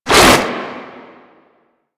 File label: Proto Infested Runner Arm Attack; Warframe; Abilities